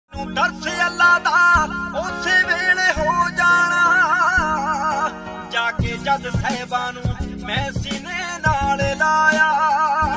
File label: Android Phone Ringtone